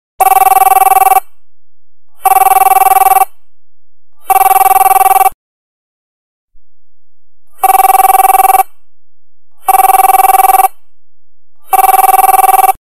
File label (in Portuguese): Toque Matrix; toques para celular